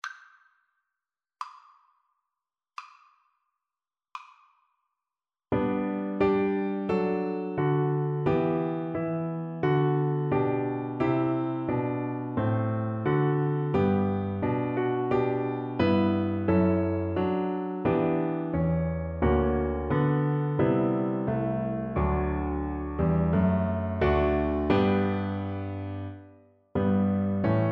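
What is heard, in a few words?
recorder karaoke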